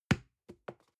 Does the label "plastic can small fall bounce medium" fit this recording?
Yes